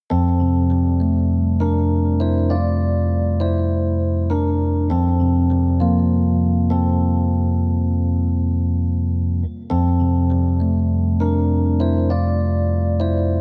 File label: Conversation Screen; bullet girls 1 2 soundtrack